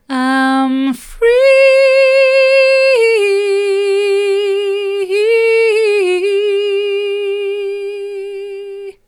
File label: mid, vocal